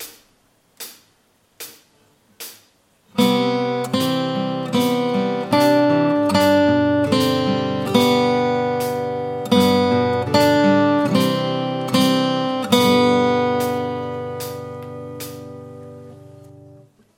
Second string exercise

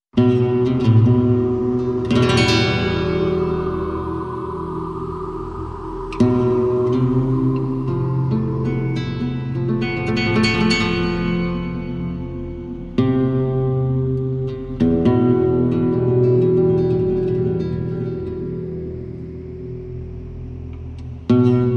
guitar

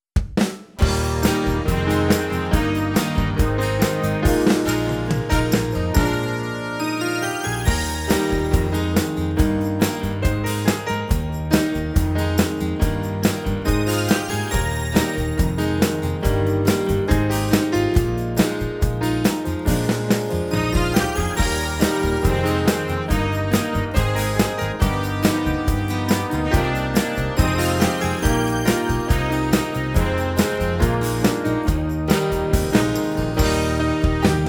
Children Backing

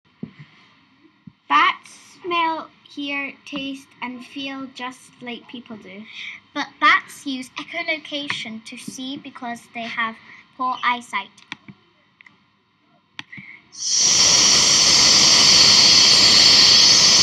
bats

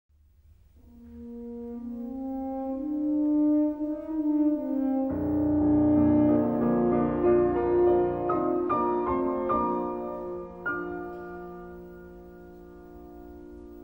Romantic

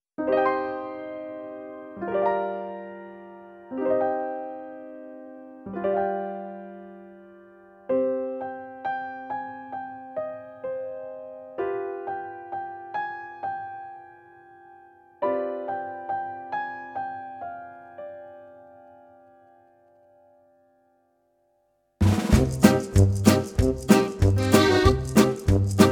Backing; Little Star

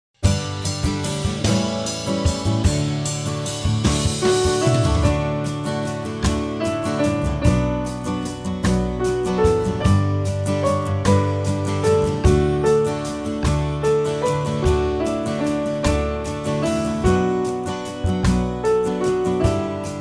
backing track; Cearcall a Chuain

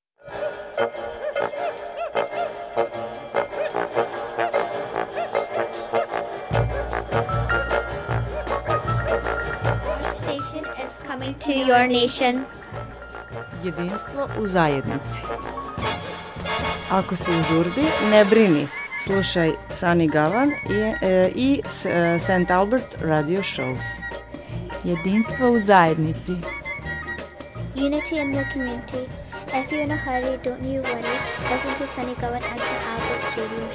star jingle

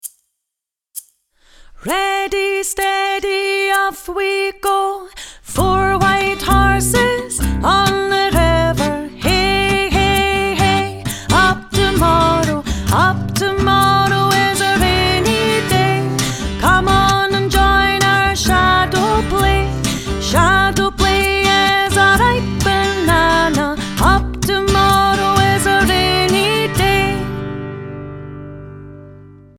Four White Horses

